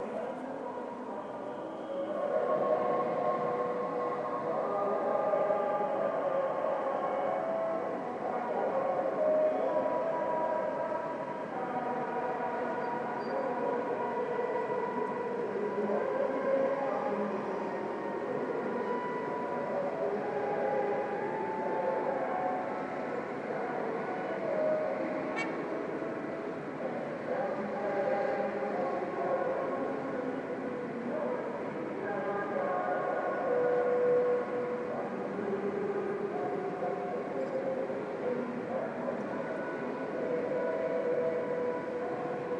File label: Ambient street sounds of Seoul protest music togetherness